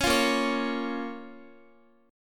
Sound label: A sharp Minor A sharp down Guitar; A#m; strummed